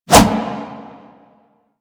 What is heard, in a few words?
Melee Kick Whoosh; Warframe